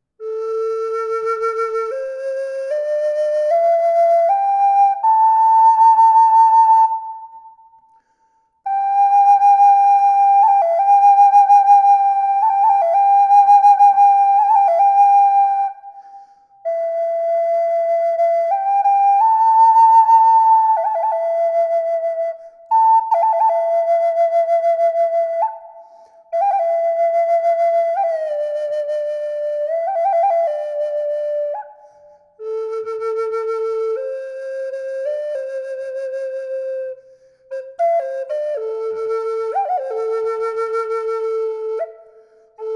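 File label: reverb, flute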